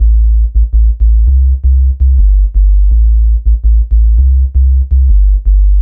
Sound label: 165bpm Dm, Drum & Bass, Bass Loops; Hardline; Total Drum&Bass